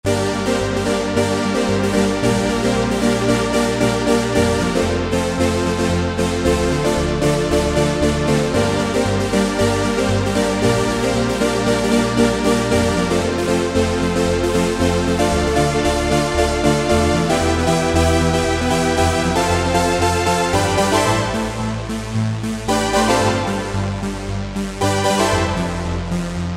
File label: EPIC SYNTH; Akai SG01v